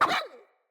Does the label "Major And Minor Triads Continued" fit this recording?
No